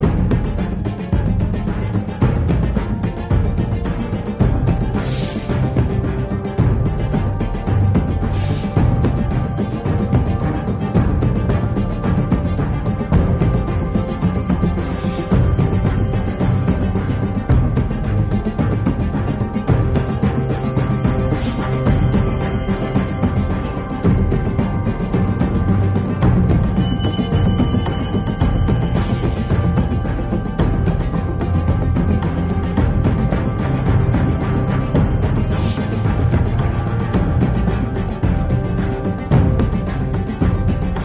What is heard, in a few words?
loop Tense